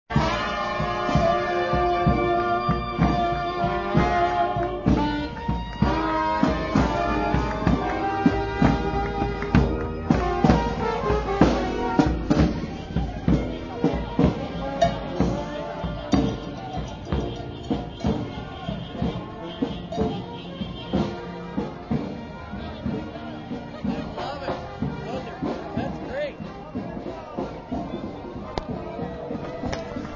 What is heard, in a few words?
celestial brass band; saint gabriel s celestial brass band